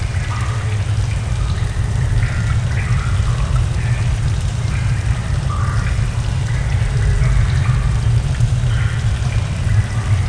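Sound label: ambient water cave; secret garden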